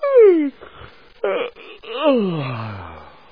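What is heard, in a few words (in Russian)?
зевание милое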